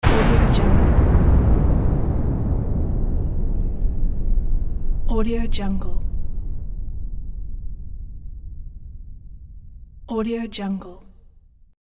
missile launch; hothead; hollywood edge

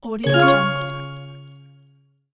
notification

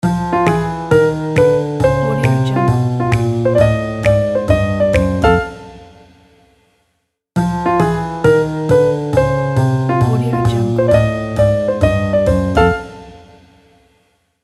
the jazz logo

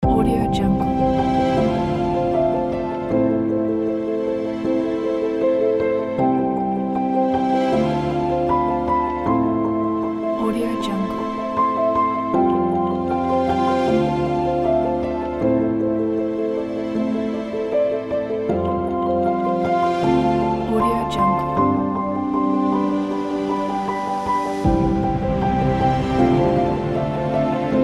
uplifting piano

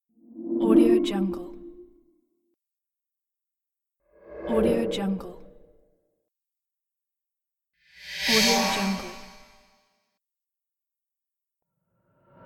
sweep transitions; livingroomclassic

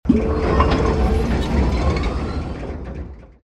mechanical elevator lifting platform steampunk

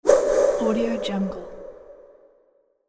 reverberated whoosh

